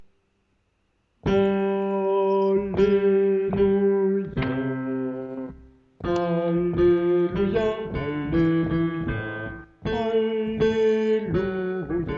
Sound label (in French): alleluia basse